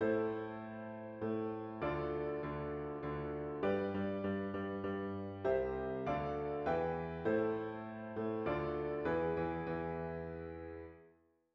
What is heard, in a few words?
piano